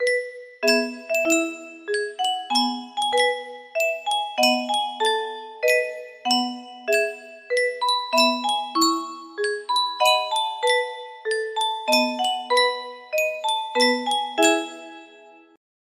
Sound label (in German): Spieluhr, music box melody